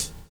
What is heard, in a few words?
VINYL HH, Drums Dance & Groove; USB Soundscan vol.11; AKAI; VINYLKIT